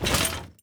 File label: Armor